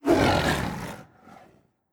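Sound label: Huntress Run Tiger voice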